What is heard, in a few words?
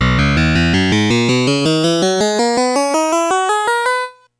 Paint Bucket Tool; Art Alive; Genesis